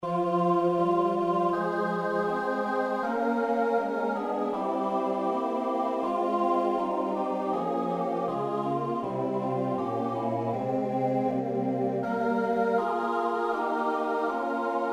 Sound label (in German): Tutti; Schoenster Abestaern